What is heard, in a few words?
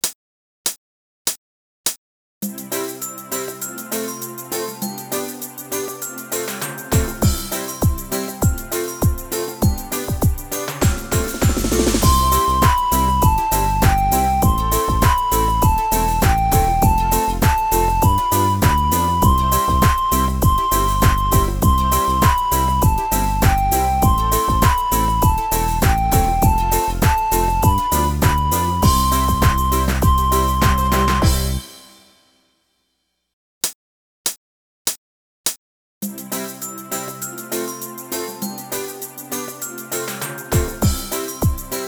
bells are ringing